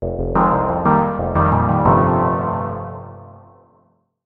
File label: jingle